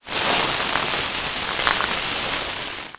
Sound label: powder